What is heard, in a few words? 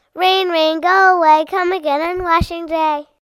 rain